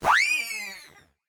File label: Run